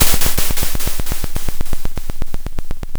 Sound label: pop, Sound of a drum roll